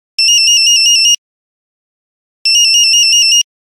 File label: Nokia Buzzer Mosquito; Windows Phone apps